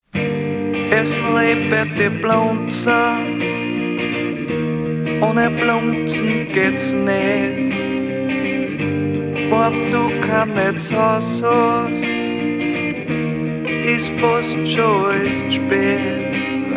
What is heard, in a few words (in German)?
Jingle; Blunzn